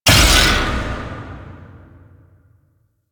Heavy Block Ability Burst; Warframe; Duviri; Duviri Dax; Abilities

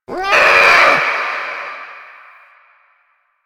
Ambient Meow Far; Warframe; Kalymos

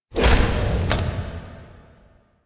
UI Mod Deactivate Generic; Player Ship; Warframe; Railjack

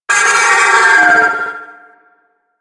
Trooper Vocal Death; Warframe; Sentients

